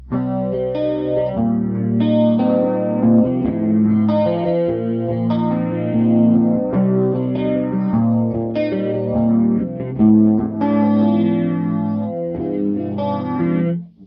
arpeges, guitar; native instruments; guitar rig

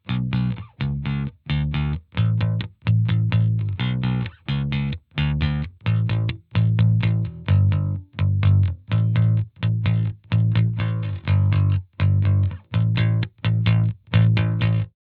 mediator rock, bass roadworn; fender bass roadworn

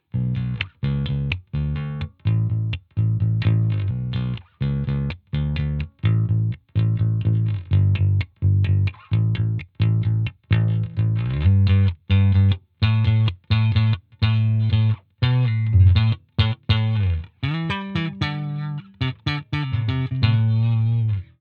doigts rock, bass; fender bass roadworn

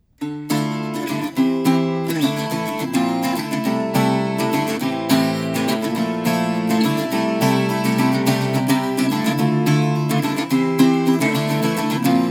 bouzouki di et mic c414; audient mico

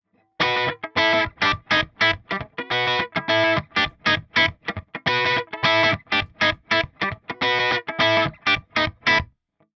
crunch chevalet, gretsch

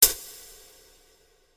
5 secondes, reverberation acoustique; waves

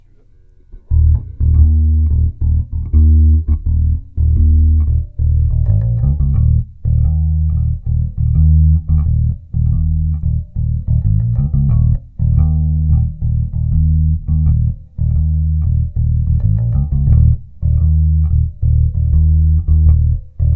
basse phase variations; little labs ipb

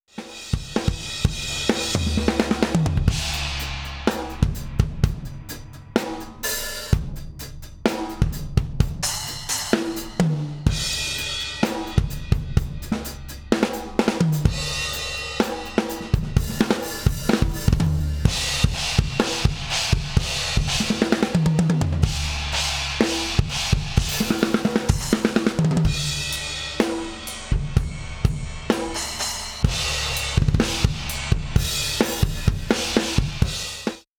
batterie compresseur ratio 10 1 release 180 200 ms release feed forward; rupert neve portico

